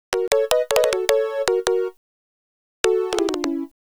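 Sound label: Flutes & Swingers Keys F#; Zero-G Kocktail Kollection; Construction Kitz (Lounge); Flutes & Swingers 156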